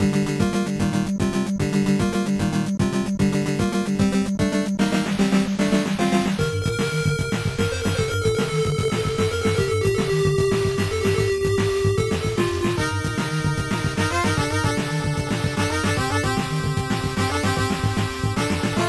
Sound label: Stage BGM; Mono~Stereo